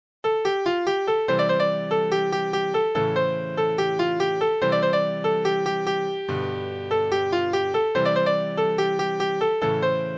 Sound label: Piano